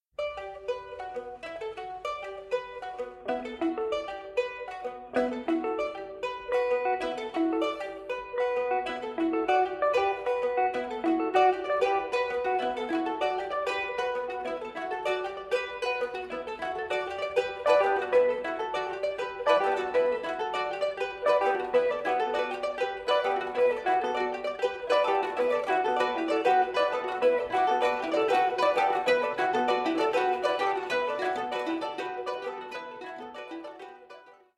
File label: Electric Counterpoint fast, Mandolinenorchester; Mandolinenorchester Neuenhain